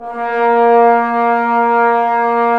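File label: brass trombones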